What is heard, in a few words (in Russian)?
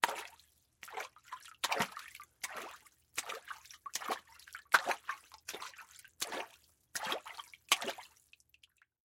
zvuki shagov cheloveka po vode